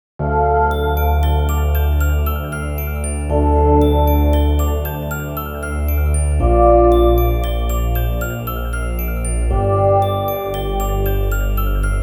Mystery jingle; Devil Survivor Overclock

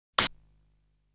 Object damage

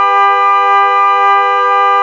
Loop Horn Standard

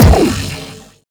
Sciweap Shot Beam Small Thermal Defibrillator

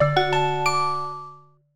Goal Complete; SimAnimals; nds

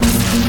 Player Shocked

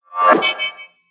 Coin launch; We Are the Dwarves

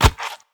Queen Attack Hit; we are the dwarves gamerip 2016

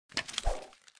Notification Open; Kingdom Rush Frontiers

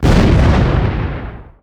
Weapon Tank Gun Heavy

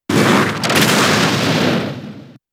Belch; pokemon sfx gen 7 attack moves sumo usum

